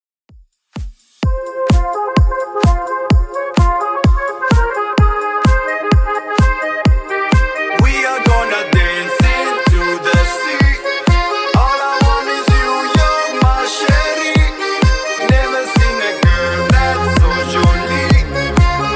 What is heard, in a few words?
2ks Redrum 128bpm